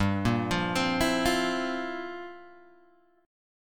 G Minor 13th G down Guitar Open G

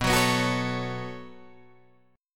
B 7th Suspended 2nd, Guitar, Drop D

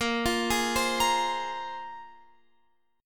A sharp 7th Suspended 2nd, Guitar, Open F; A#7sus2; arpeggiated

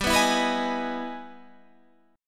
E Major 7th; Emaj7/G; strummed; JGuitar

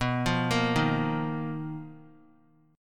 B Major 7th Flat 5th B Guitar; arpeggiated; guitar chord

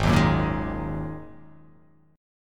G sharp 7th Suspended 2nd G sharp

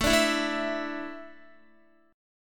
A Suspended 4th, Guitar; Asus4; strummed; x,12,12,9,9,11

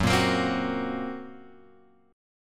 F sharp Major Flat 5th F sharp; F#Mb5 Chord {2 1 X 3 1 2} strummed

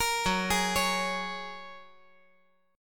F sharp Major Add 9th, guitar; chordshape; down

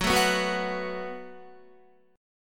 Gb Minor Double Flat 5th, Guitar; Gbmbb5 strummed

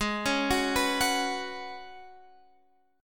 F sharp Suspended 2nd Suspended 4th G sharp

